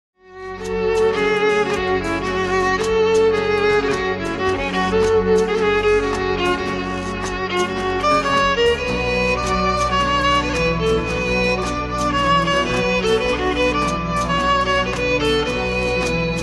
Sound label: Instrumental Ring